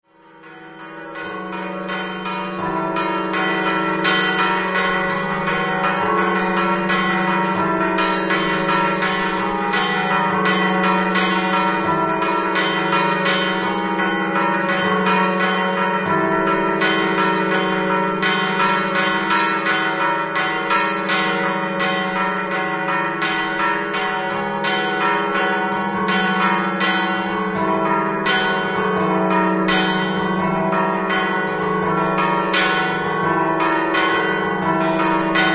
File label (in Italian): cividale, campane